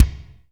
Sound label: Reverb Kick Snare; PS-37 Dance Synths; Percussion